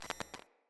Decoy Cardinal Static; Objects; Thawtide

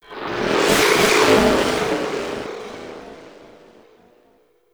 Harvester Player Warning; Alad V; Corpus

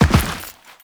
Land Dirt; Enemies; Footsteps; Warframe; Teshin

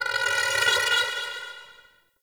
Sculpture Movement; Warframe; The War Within; Mountain Pass; Oro

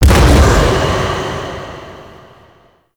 Void Dragon Orb Proj Explosion Poison; Enemies; Abilities; Warframe; Duviri

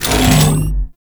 Devour Regurgitate; Warframe; Grendel; Grendel Deluxe

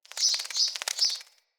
Fey Flock Attack Dive

